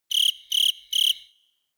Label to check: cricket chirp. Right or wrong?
Right